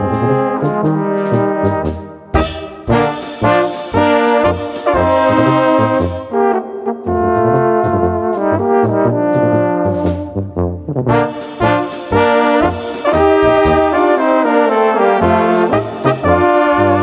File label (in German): blasmusik minimalbesetzung